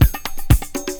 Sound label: Percussion Loops, Brazilian; Houseworx